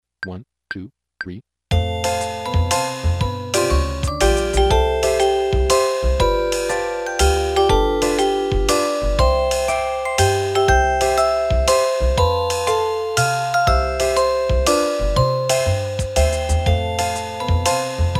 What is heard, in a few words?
HAPPY BIRTHDAY TO YOU; Factory Demo Songs